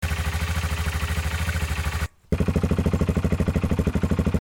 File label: Stock Adv Pipe 10 feet idle, Exhaust